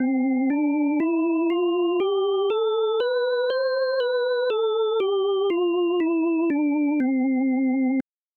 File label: c major scale leslie fast, hammond